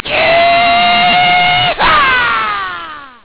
yeha

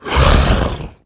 wound

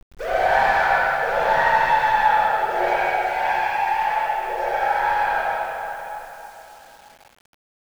insane screaming; svencoop

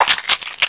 torn off; dmc

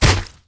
FLESH; svencoop; aliens drone

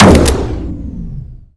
railgun, weapons

